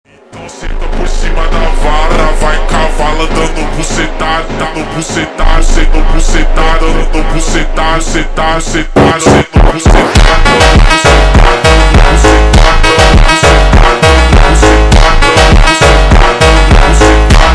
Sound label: Super Slowed, phonk music; funk